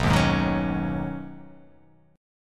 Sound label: B 6th Add 9th B down, chord